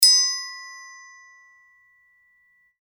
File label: triangel